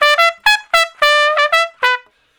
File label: Trumpet Straight (E); Solos